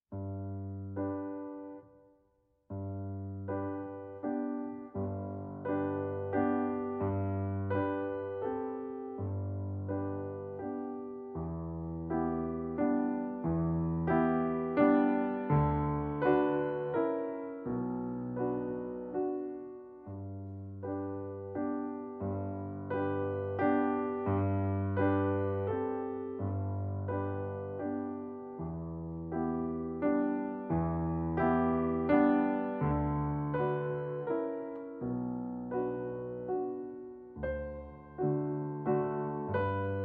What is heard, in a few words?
Waltz Op. 39 No. 15 accompan; والس; کتاب قطعات طبقه بندی شده کلاسیک